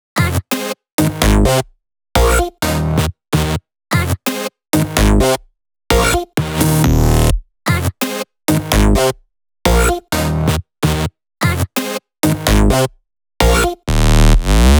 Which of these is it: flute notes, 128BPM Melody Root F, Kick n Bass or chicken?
128BPM Melody Root F